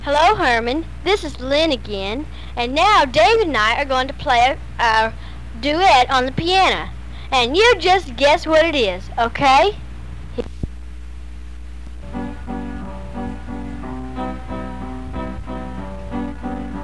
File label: piano duet